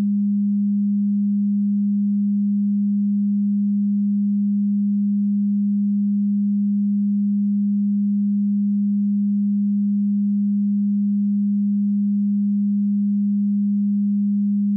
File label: 400Hz -16dBFS